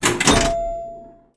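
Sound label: destruction pinball sounds; Explosions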